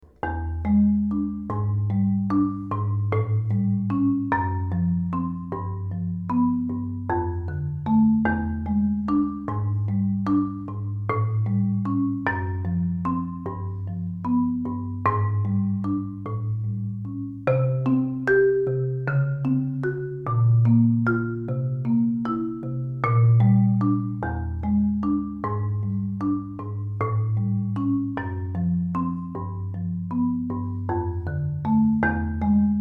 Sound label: Mahamarimba Mic Test